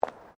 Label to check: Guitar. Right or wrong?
Wrong